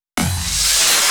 Fill 128 BPM; Vengeance Samples; VEH1 Loops

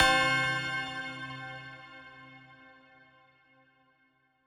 Big Bell, 141 BPM; Vengeance Samples; Once Upon A Time

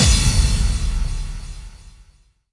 Impact; Vengeance essential club sounds-3

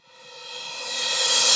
Reverse Cymbal; Vengeance Samples; VEH1 Cymbals

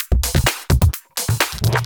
Houseloops 128BPM; Vengeance Samples

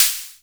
Ride, Cymbals; Vengeance Samples

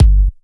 Kicks; Vengeance Samples

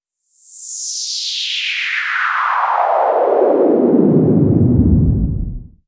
FX Sweep Down; vengeance essential club sounds-3

